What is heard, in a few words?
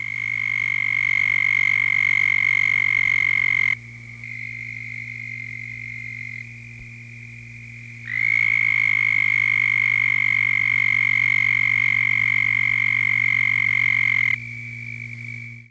southern toad breeding chorus